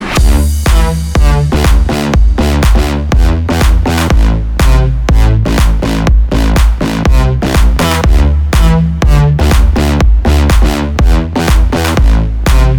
house bounce

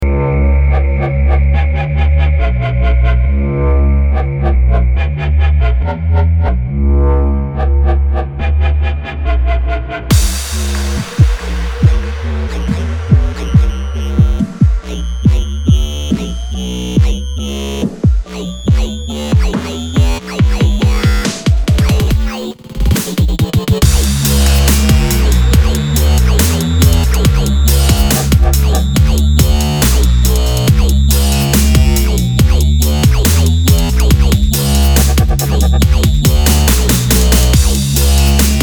dubtech

